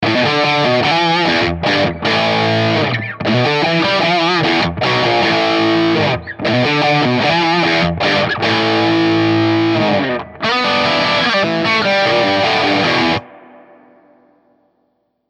Rockerverb 50 MKII Gibson Les Paul Special PPC412A